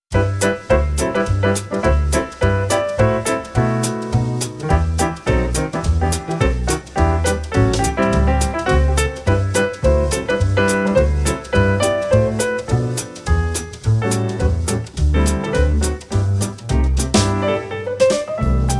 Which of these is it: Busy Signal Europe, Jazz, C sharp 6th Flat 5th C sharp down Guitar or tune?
Jazz